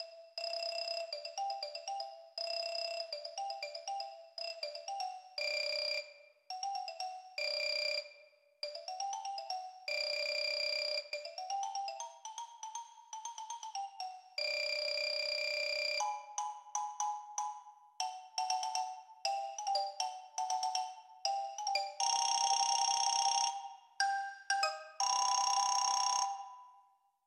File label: mallet audition